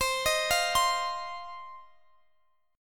C Minor Double Flat 5th C down Guitar Open D

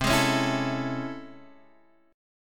A Major 7th C; strummed; JGuitar

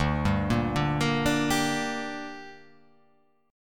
D Suspended 4th Sharp 5th D down, chord

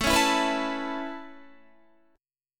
A Major Add 9th A Guitar, chord; Aadd9 strummed; JGuitar

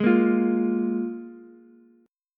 F Minor Double Flat 5th, Ukulele; Fmbb5 strummed; JGuitar

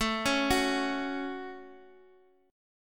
A 6th, Guitar, DADGAD; x,x,x,2,4,4

